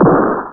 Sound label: single shot